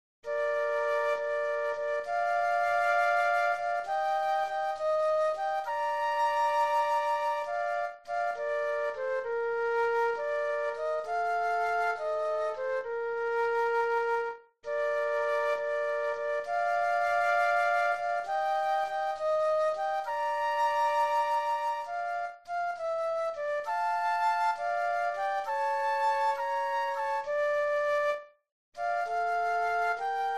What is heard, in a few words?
Flute Duet; christmas